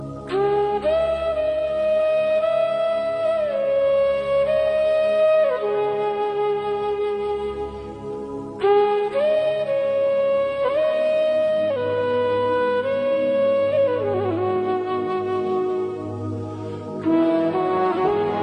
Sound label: very sad flute; alternative